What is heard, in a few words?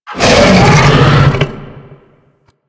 Infested Bone Blade Vocal Soft; Warframe